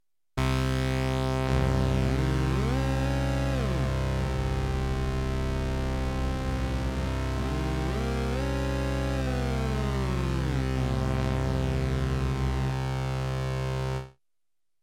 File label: Kawai sx240 dco2 detune